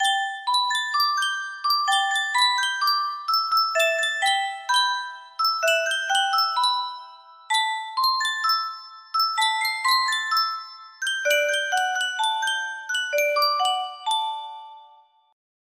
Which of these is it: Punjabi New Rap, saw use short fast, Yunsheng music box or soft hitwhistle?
Yunsheng music box